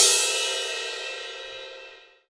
big cymbal crash sound e key, Royality free crash sound clip tuned to the E note.; drum sample; one shot